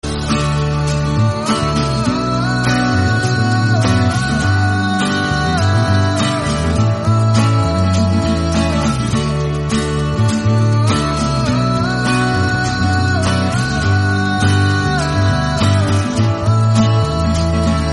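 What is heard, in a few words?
ho ho ho cute violin music tone ringtone; iphone ringtones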